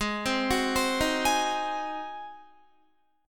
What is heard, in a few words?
Ab 7th Ab Guitar 4F,4A,5C,5G,5C,6E; 15,15,18,17,15,16; arpeggiated